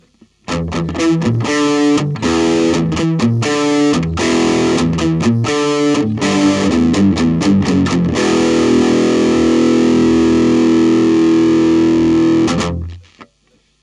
gain12; esp

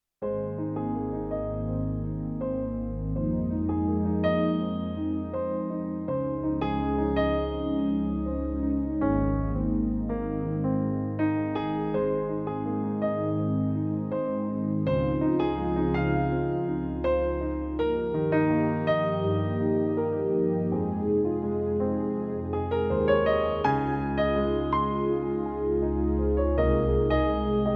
yamaha p-255

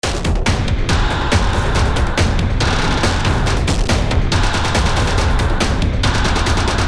loop industrial; ni heavyocity damage testbericht

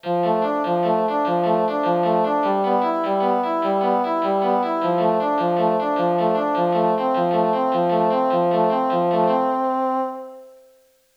orchestral, Startone MK-200

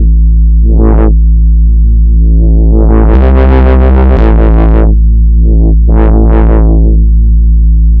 Peak wobble; novation peak test

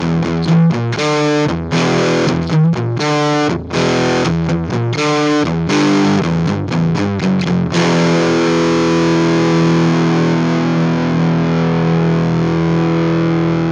gain12; rocktile

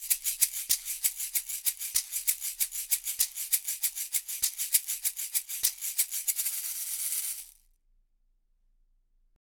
shaker thomann sc450

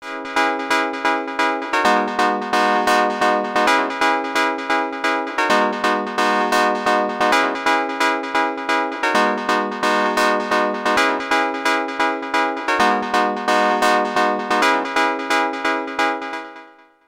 korg minilogue chord hit

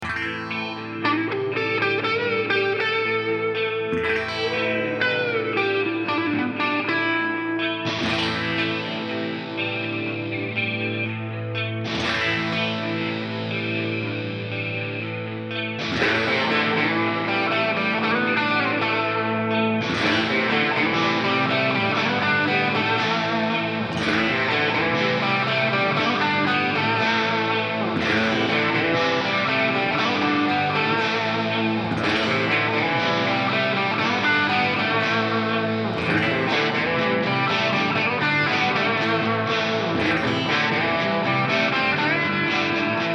ik multimedia amplitube 4 guitar bus

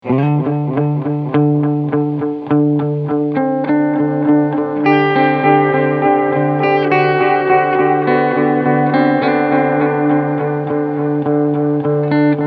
medium delay clean; t-rex replicator

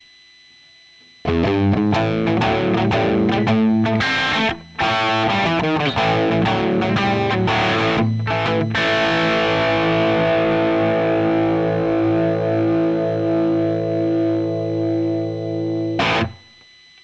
alesis guitarlink fx

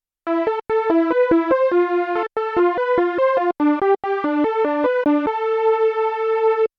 osc unison; Lead-Sound erstellen am Synthesizer

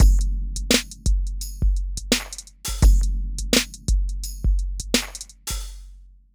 hip-hop drums

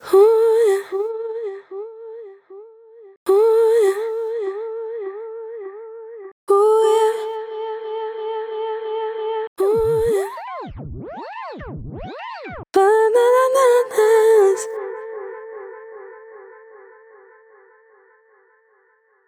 u he colour copy delay; Delay-Plugins

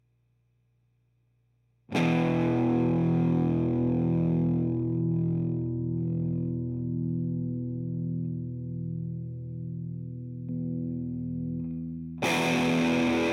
kma minos all mid position

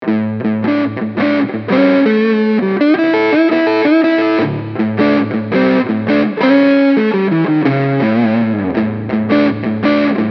front pu parallel; ibanez artstar as153b

